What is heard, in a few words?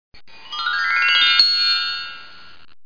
morph; How Many Bugs in a Box